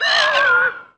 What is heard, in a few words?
moan; The Elder Scrolls III - Morrowind